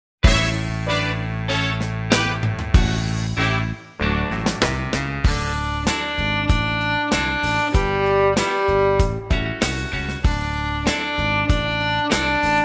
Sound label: open string boogie